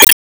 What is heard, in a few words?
Spike Hit